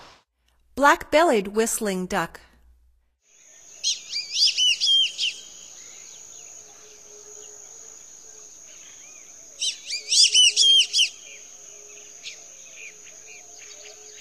Black-bellied Whistling-Duck, Songs of Birds of North America; Stokes